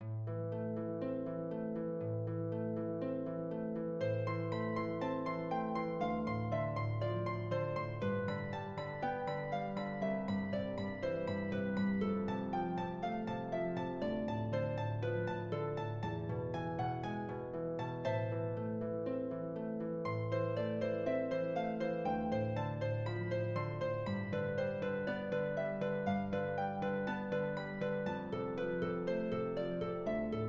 Passacaglia Simple Harp

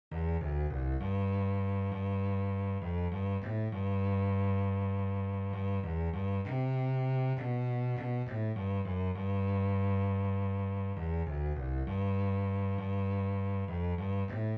Blessed Assurance Double Bass

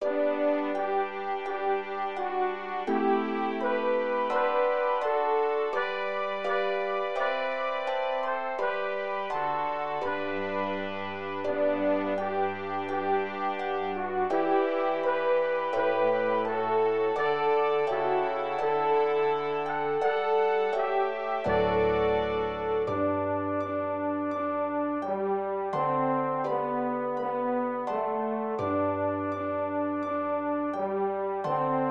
ENSEMBLE; HARK THE HERALD ANGELS SING

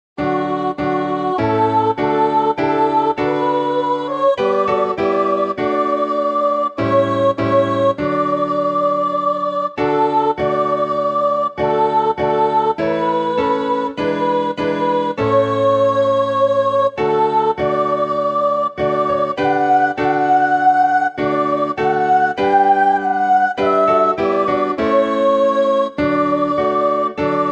Soprano Descant; Redeemer of Israel

